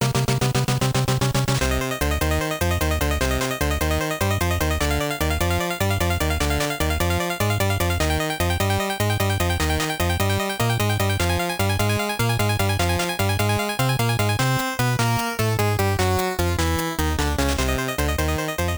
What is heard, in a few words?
Last Boss BGM ~ All Clear BGM; Black Night